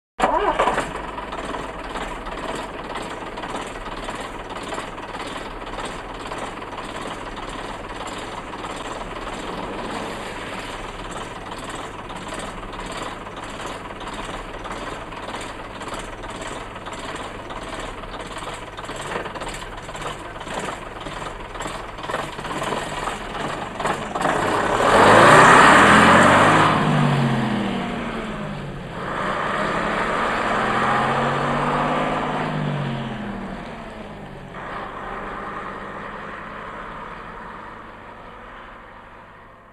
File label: Bus Start Away 1950s B